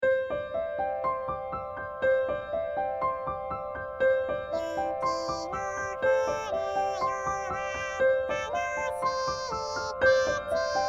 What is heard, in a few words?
female alt